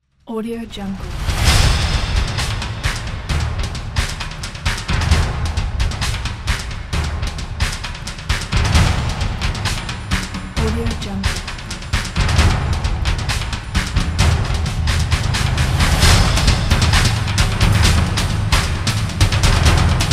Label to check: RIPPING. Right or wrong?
Wrong